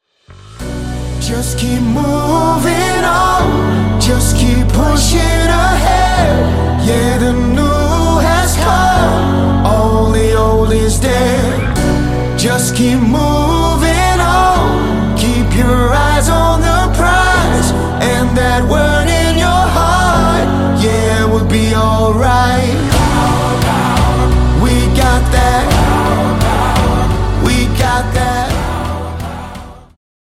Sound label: power acoustic mix